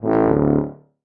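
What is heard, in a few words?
sounds of tuba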